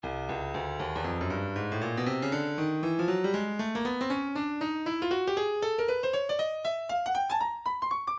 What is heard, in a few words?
stylus breakbeat midi files 118 bpm; Swallow B